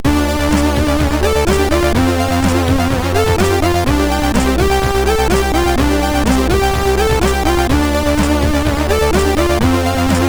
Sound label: Beat, MOS6581R4; Synthetic Vortex